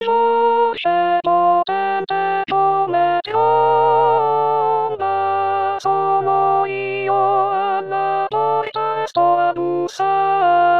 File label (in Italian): Contralti